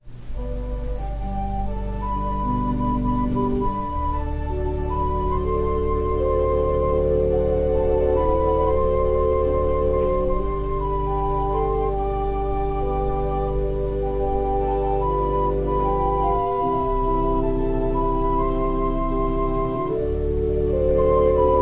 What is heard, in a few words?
Organ prelude; Zacharias and Elisabeth